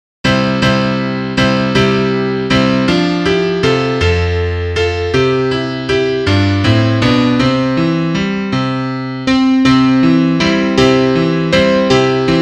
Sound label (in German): Klavier; Liederbuch; komm lieber mai